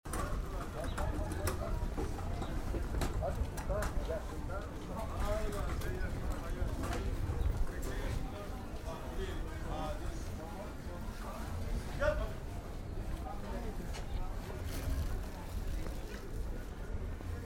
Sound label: ambience